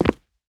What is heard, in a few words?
rubber, player footsteps